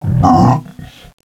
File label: burp; DS; ML BOWSERS INSIDE